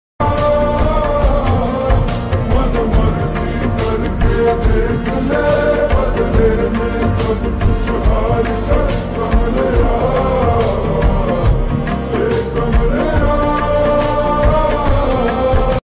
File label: song sad slowed and reverb